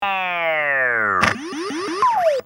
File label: Coily Over the edge; qbert